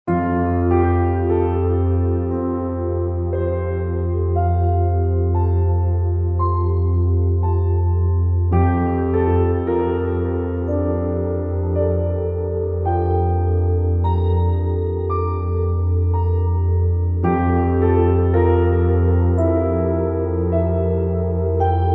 FX Piano

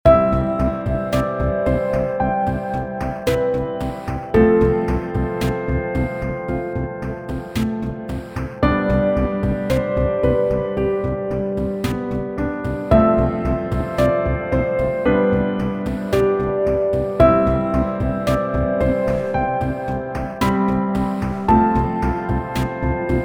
Rainy Piano Loop

